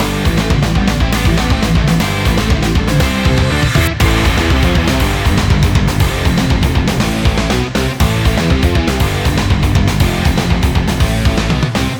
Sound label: Volcano; Slayin 2; switch windows gamerip 2020